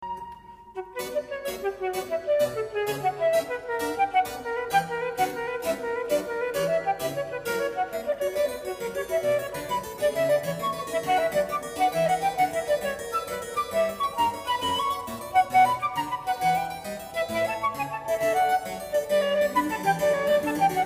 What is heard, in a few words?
Flute